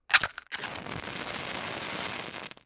match strike

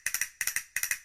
castanet

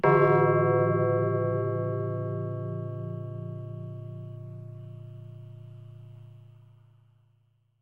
pendulum clock bell, clapper

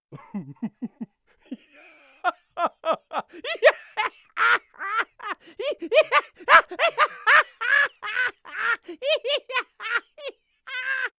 Laughing, manic evil laughter

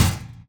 metal plate, hollow

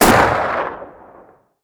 marlin ext shot, gunpowder

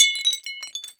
coffee cup drop, small coffee cup drop and break

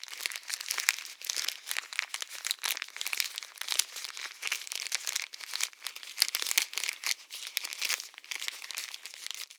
paper wrap

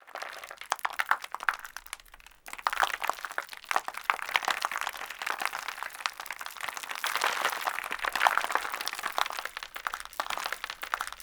rockfall slow

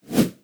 melee swing

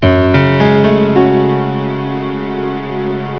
piano chords

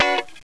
GUITAR HIT

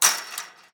fence hit

